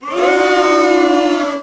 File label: boo; WhiteOut